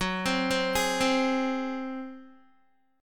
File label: Gb Suspended 2nd Flat 5th Guitar 4F,4A,5C,5G,5C,6E; arpeggiated